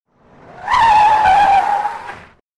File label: Lincoln Town Car in skid; Cars